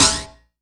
Yamaha CS1x Drums

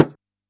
DJEMBE, Yamaha DD-65

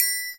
TRI, triangles; Univers Sons; Basicussions

